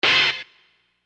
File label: Stab